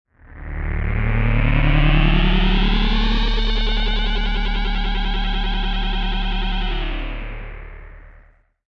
Ufo Trying To Take Off; UFO, flying saucer unsuccessfully attempting to lift off. Also some strange machine trying to operate; Lynne Music

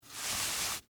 Rope Pull Twine; Hand Tools (Sound Effects:Tools); BlastWave

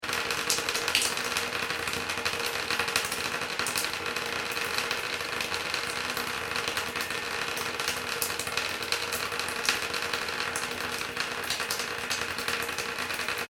household shower dripping hard fast; Shower Dripping Heavily And Fast Into Plastic Bath; Bathroom

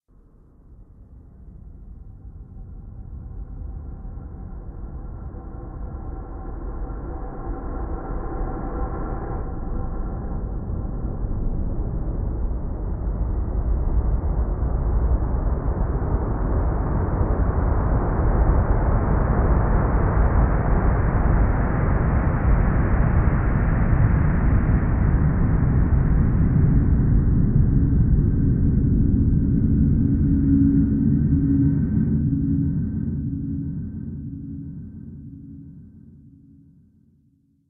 Drone Winds Of Evil; sound design; Drone Sound Effects

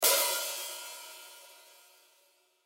Drum Hit High Hat, Drum Hit High Hat Cymbals Open; Musical; Blastwave

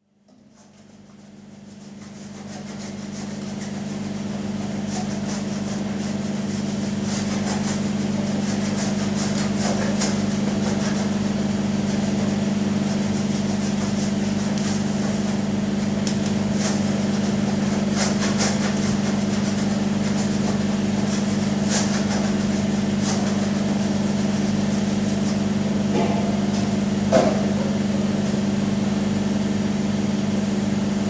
Brewery, tun room with vessels being scrubbed out; Restaurants; BBC

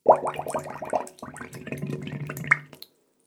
Water Bubble Sounds

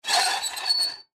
Gate Open Metal, Gate Open Metal Squeak Rusty; Gates; Doors; Foley; Blastwave

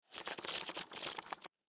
Book Hard Cover Pages Turn Flip Through Long; Office; Paper

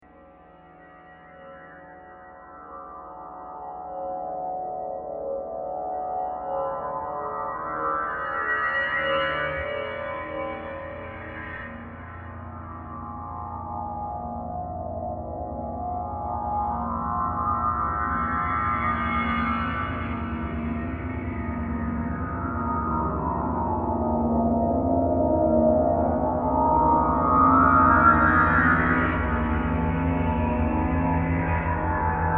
Feel The Fear Movie Trailer Transition

